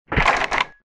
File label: locked wood; svencoop; lostmaze